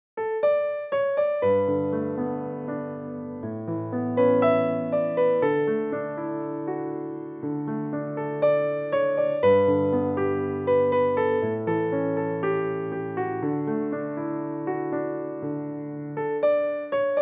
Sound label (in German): Pianoversion